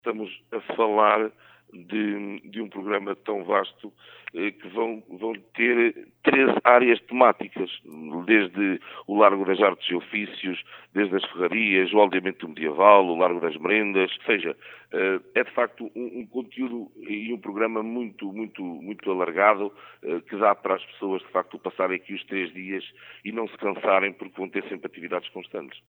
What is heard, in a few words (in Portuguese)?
som feira medieval; A Feira Medieval de Torre de Moncorvo já tem data marcada. De 2 a 4 de maio, a vila regres